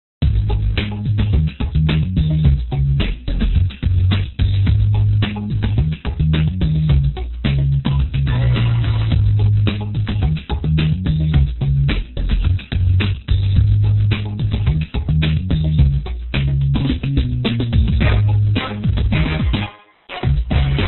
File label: hiphop